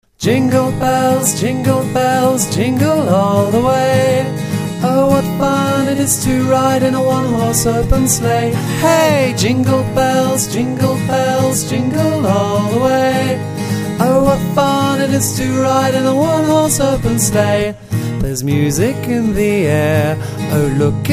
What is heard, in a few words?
jingle bells